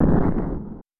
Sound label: Flames; Super Smash Bros